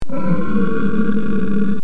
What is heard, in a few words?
silent beast growl